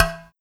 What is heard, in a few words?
Perc Udu High